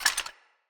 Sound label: Foley Action Metallic Punch; Arm